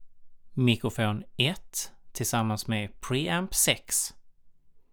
mic pre

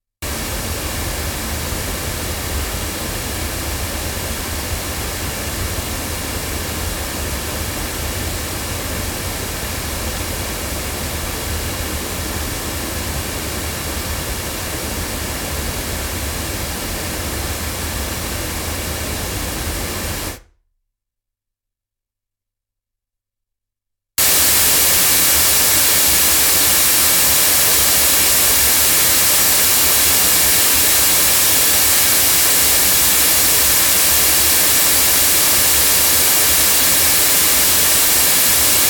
Violet Dolly loudspeakers, microphones

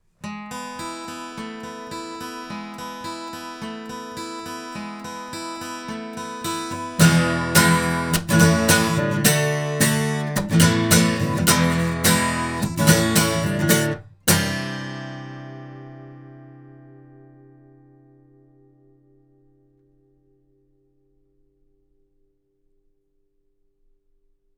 guitar, microphones recordings

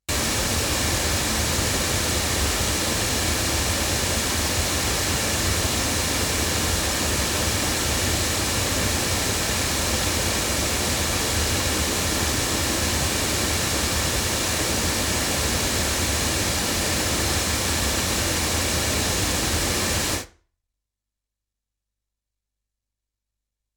Milab LSR-1000 loudspeakers

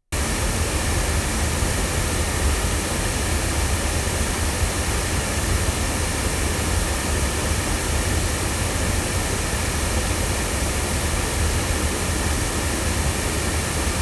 Manley Reference cardioid loudspeakers

